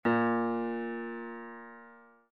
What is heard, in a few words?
Piano ff Bb2